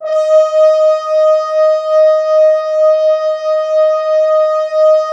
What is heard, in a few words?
Orchestral Brass